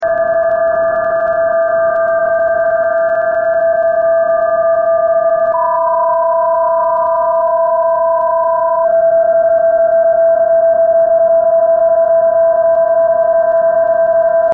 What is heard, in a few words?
Psk31 recording